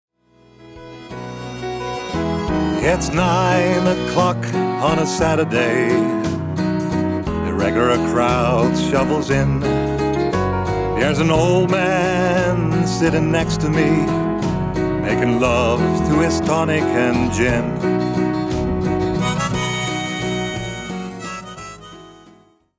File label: piano